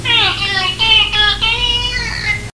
dolphin sounds; hogstory; iso good boy

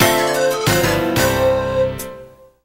jingle instrumental

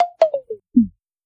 airpod bat low

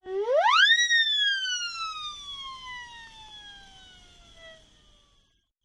siren whistle

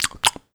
berry munch eat; OHOL

